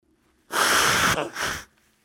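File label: nose blowing sound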